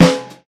rich lows steel snare drum f key, snare sample tuned to the F note; snare drum samples